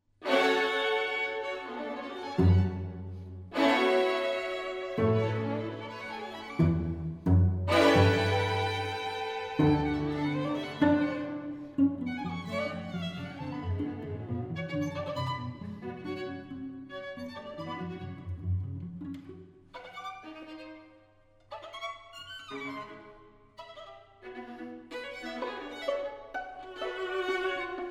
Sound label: String Quartet; Edward Cowie; 'Birdsong Bagatelles'; Cuckoo